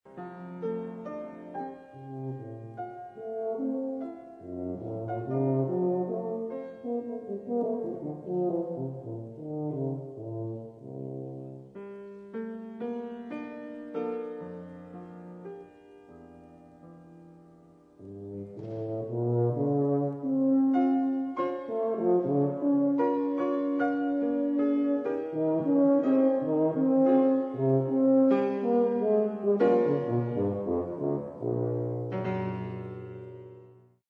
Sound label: Tuba Piano